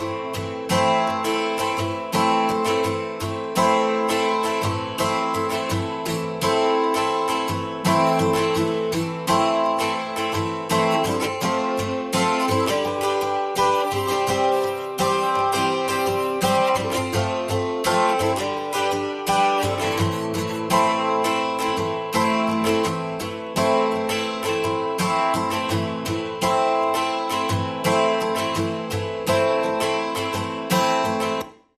Motivation Straight Ahead, No Drums

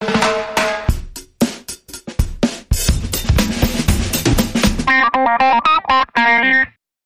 Dig It Baby Rock Bumper Reversed

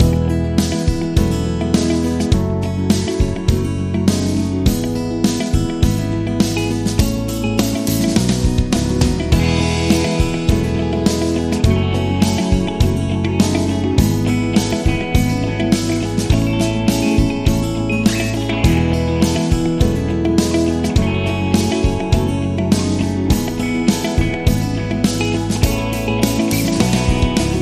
Groovy Pop Explosion

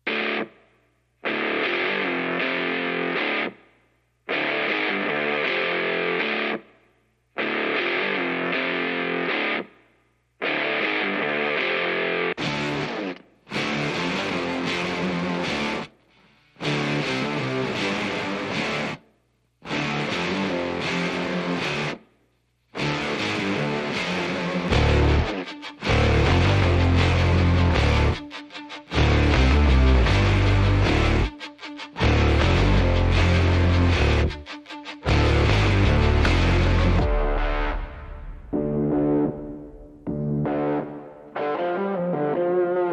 No Drums No Vocals

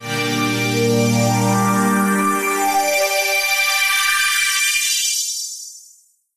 Grand Big Sweep Chord Transition

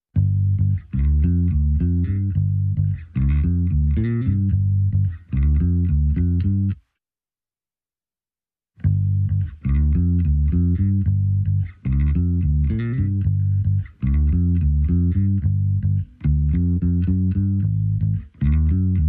Bass Only

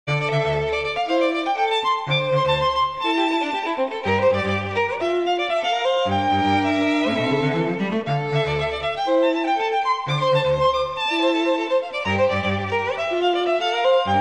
Rythmic Melody

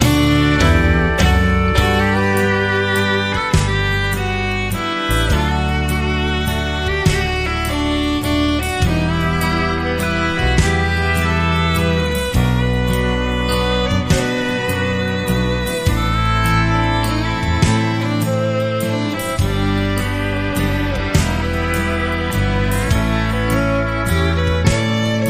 Sunset Waltz

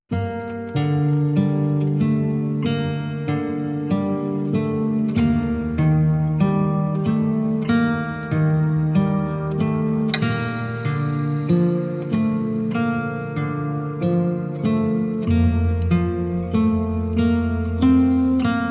No Strings

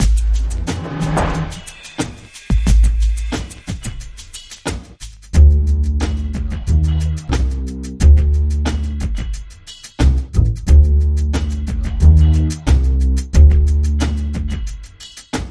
Dark Jazz Bass

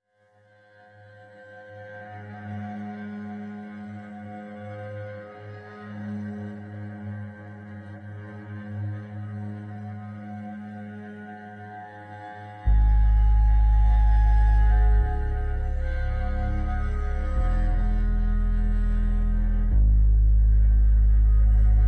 Ambiance, Basic Mix

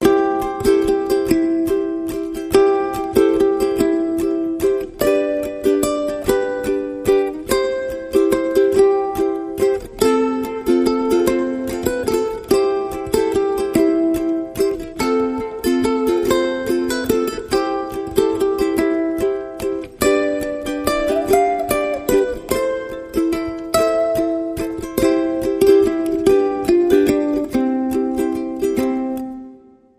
Ukulele Guitar

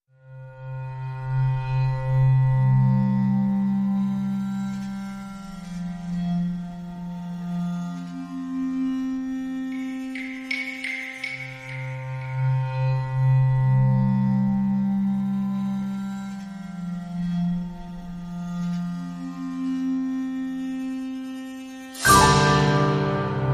Greek Lament

